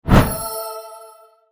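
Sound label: tower warning; HYD HERO Healing Waters Impact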